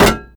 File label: Impact Metal Hits; Desert Rats vs. Afrika Korps